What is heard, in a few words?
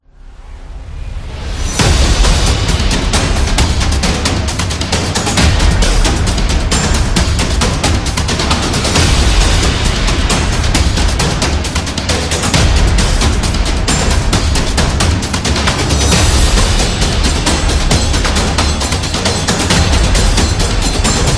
Horror Trailer; monster attack